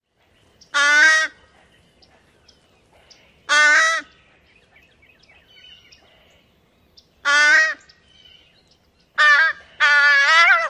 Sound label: juvenile nasal calls